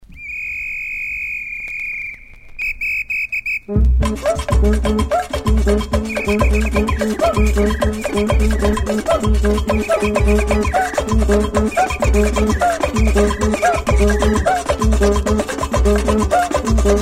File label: batucada